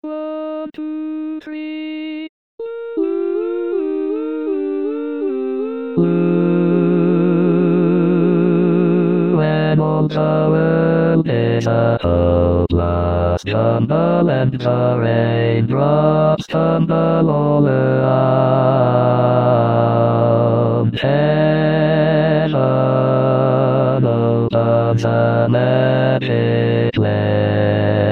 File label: Bass